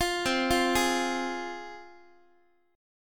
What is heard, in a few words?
C-Suspended 4th Sharp 5th C down Guitar; Csus4#5 arpeggiated; x,x,8,10,9,7; JGuitar